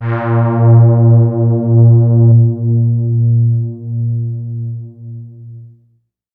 Strictly Synthe, Excessive Stereo; E-MU Producer Series Vol. 6 – Analogia Project

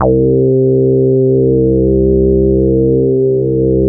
KT76 REZO, SYNBASS; Synthesizers; Soli-Music; World of Synthesizers VOL-1

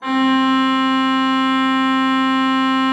C4, Cathedral Organ, HOLZPRINZ.; Propeller Island

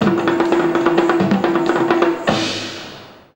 PERC, India; Best Service; Ethnic Journey Sound Cube

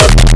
bonk kick

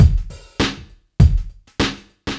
drloop, drumloops, Vinyl Attack; Masterbits

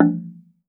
TONGUE DR; TONGUE DRUM

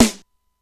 SDS9 SN C4, Simmons SDS-9

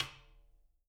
SN RIM, snares; Sampleheads; Peter Erskine Living Drums

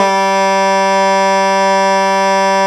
BASS SHAWM; SoundBytes - Early Patches

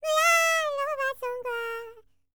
HIMALAYAN, World Voices; ILIO; Vocal Planet VOL-5

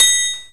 LINN BELL; LINNDRUM9000; Greytsounds VOL-1

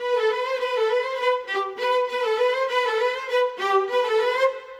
Viola, Orchestral; Sonic Foundry (Sony Creative Software); Orchestral VOL-2

